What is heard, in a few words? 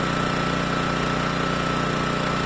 generator